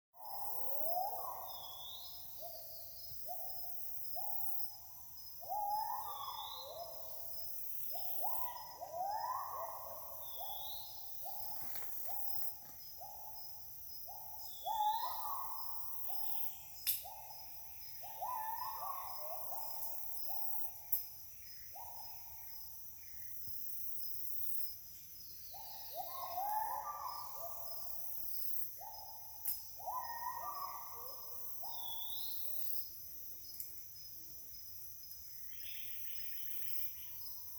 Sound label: Duet, gibbons; Hylobates albibarbis duet